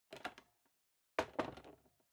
Electric Fan Put Down

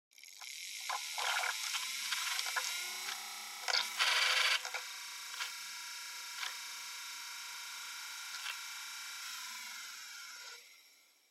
Portable MD Driving Noise Play

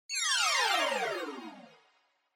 Scene Change Down Stereo Pan

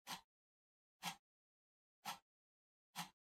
PC Mouse Move Far Short

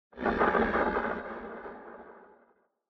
Horror Accent High Short

